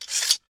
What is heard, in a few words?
draw sword short; Aion